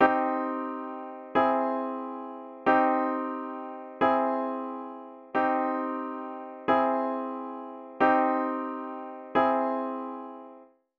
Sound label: C Minor Vs Augmented